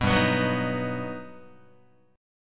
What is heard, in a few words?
G Augmented Major 7th, Guitar; G+M7 strummed; G+M7 chord; JGuitar